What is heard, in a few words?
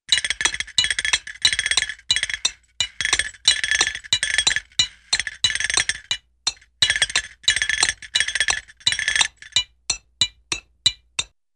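Funny Rhythmic Metallic Hits with metallic Rattle; Cartoon; Hollywood Edge; Cartoon Trax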